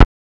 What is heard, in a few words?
Moog BD; Moog Modular55